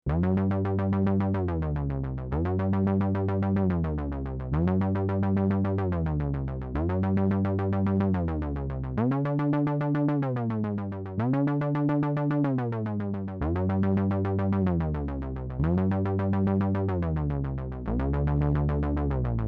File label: MS20 bass